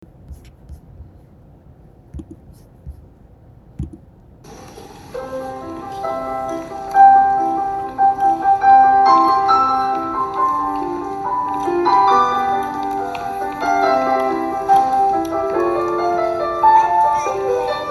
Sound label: piano